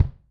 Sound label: Bass